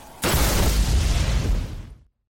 shoulder charge ringtone

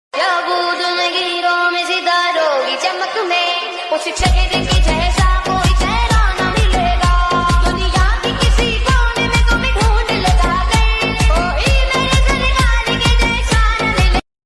hindi ringtone bollywood

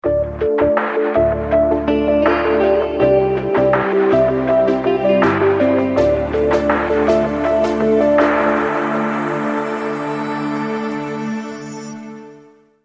news ringtone; dubai

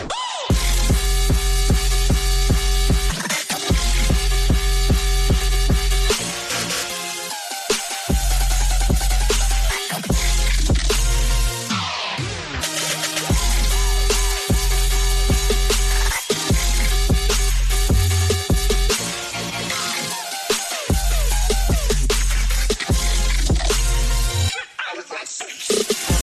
wooooohhh beat ringtone

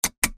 lamp switch sound effect

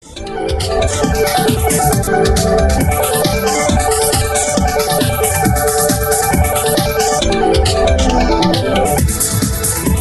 pixel tone ringtone

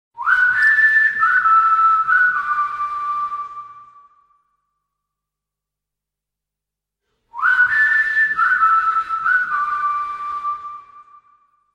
nokia whistling ringtone

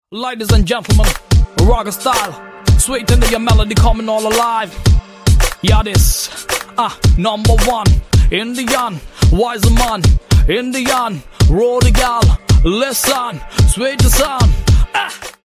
indian ringtone; bollywood ringtones

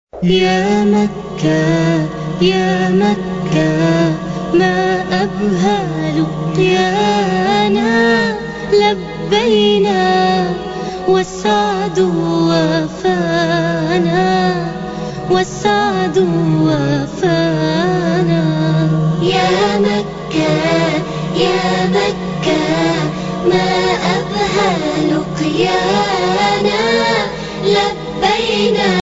arabic ringtone, islamic ringtones

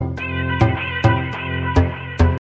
notification ringtone

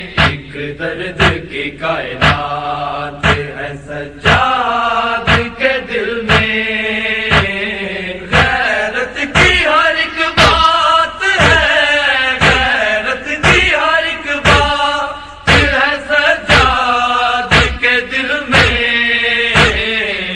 noha tone

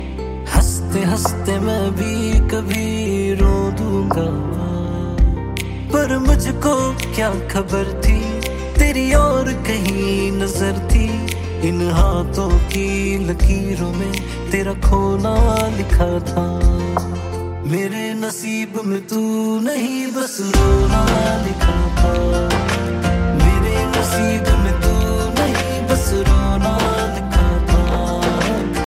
song ringtone bollywood ringtones

